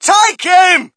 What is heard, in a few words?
crowd, shout; Mike Tyson Heavyweight Boxing